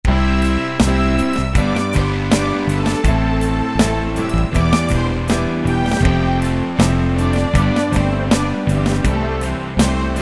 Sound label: Britpop; Preset Styles